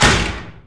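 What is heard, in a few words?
hall elevator door stop; hl2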